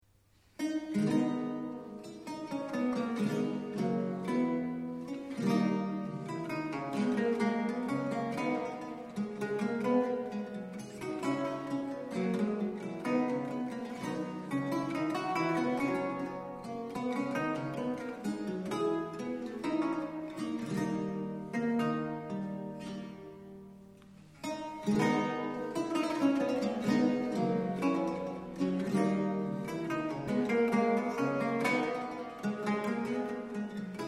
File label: Allemande, lute